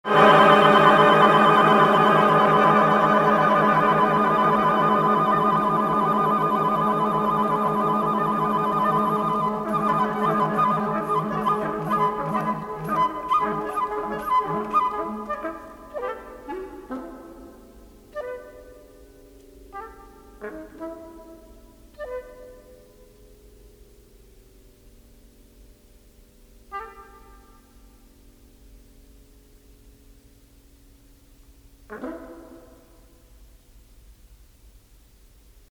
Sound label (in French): interlude